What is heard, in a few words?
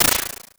Textures Noise Flutter; Impulse Responses; Ableton Live 12 Lite; Hybrid